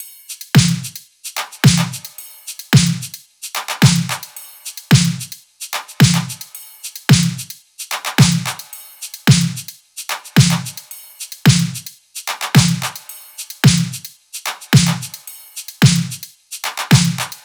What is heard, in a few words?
top, Drum Loops, Top Loops, 110; SD MIDNIGHT Sample Pack; Slate